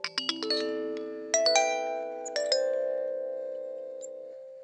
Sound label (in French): boite a musique